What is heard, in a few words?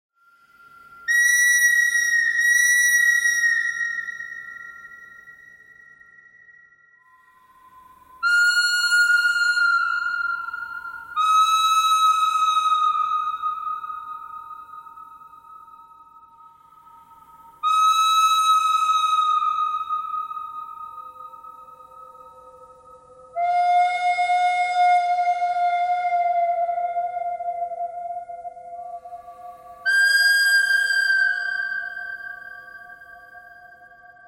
birdcry thread pentatonic; birds